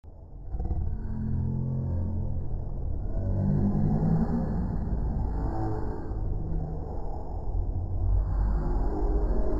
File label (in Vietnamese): am thanh cua mot con ca voi dang boi